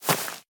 藤蔓攀爬声

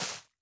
苔藓破坏声

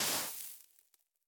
刷子刷沙完成声

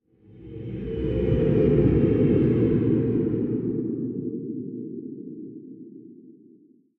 诡异森林出现声; ambient

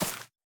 藤蔓破坏声

